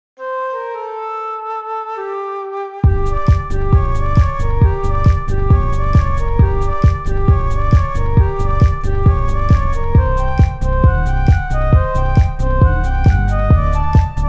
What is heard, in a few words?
flute